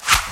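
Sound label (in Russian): звуковой эффект уош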